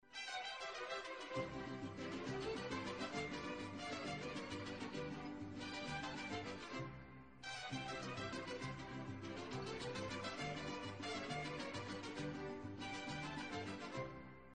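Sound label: mexico background music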